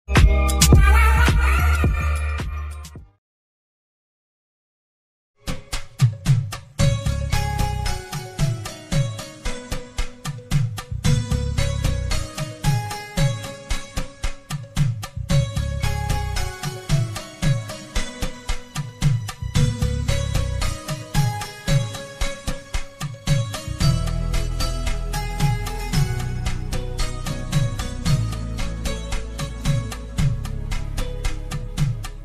bgm ringtone climax bgm tamil best love bgm romantic love bgm; kushi; vijay